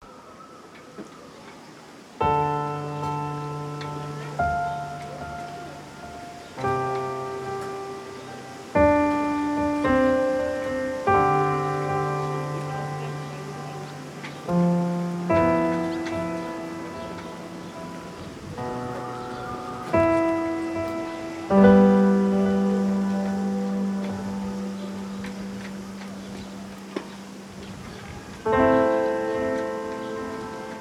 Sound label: piano miniature; blear moon; sauvignon valley